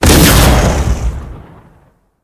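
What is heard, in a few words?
building destruction after a shell hits it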